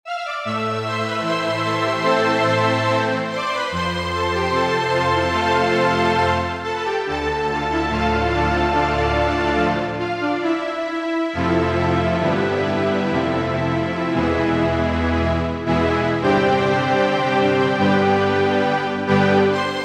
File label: strings; cms weihnachtskalender 2024 freeware